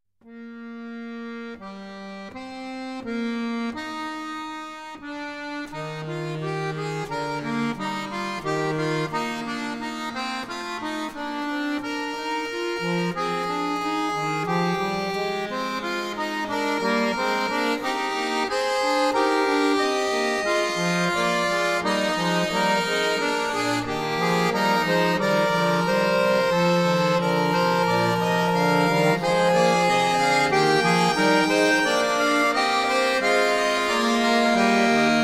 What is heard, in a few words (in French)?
triple fugue mi bemol majeur